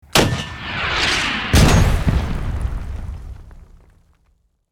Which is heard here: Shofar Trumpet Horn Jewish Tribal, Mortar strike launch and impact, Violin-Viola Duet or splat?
Mortar strike launch and impact